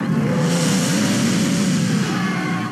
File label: Roar; Zedus; Heisei